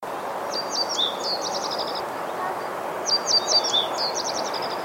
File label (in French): bruant roseaux